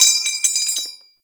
Bayonet Clip Drop, Weapons; Warframe; Tenno

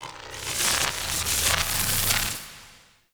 Hunter Sniper Shot Charge

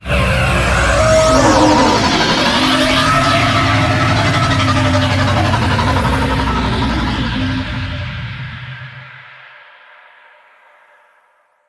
Drop Off A; Vehicles; Flight; Warframe; WM Liset

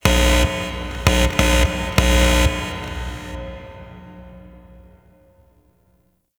Stage Amp; Warframe; Ambience; Nineteen Ninety Nine; Obj Temple Defense

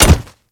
Pet Step Default; Alad V

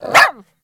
Puppy Idle Bark; Vocals; Warframe; Kubrow

